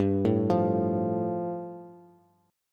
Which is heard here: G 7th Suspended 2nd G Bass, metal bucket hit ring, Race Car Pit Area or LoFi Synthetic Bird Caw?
G 7th Suspended 2nd G Bass